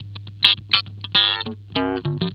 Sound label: Guitars, G; Total Drum&Bass; 90 s Sample CDs